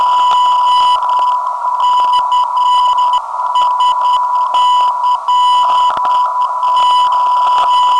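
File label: non-directional beacon; CSC; 385kHz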